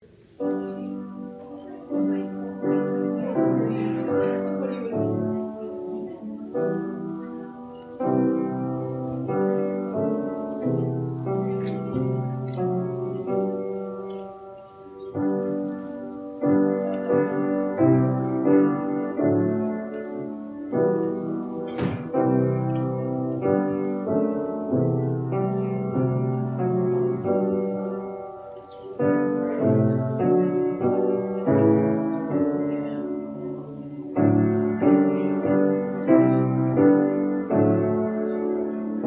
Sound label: preservice, instrumentalists